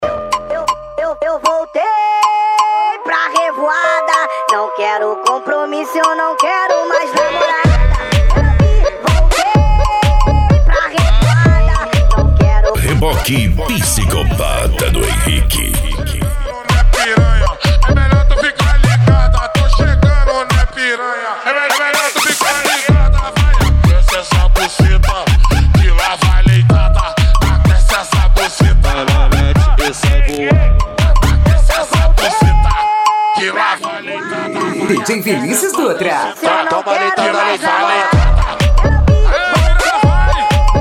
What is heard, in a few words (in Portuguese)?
eletro funk